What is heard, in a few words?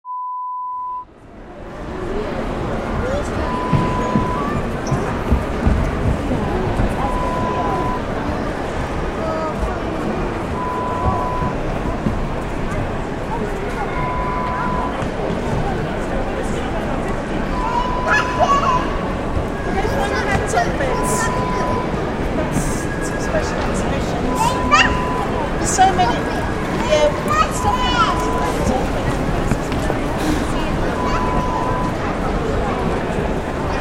Museum Ambience